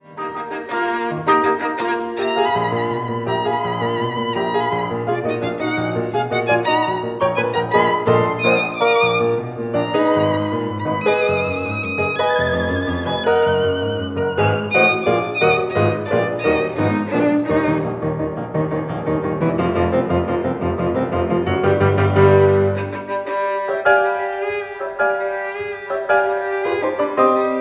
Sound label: VIOLIN